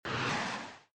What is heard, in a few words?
airplane fly speed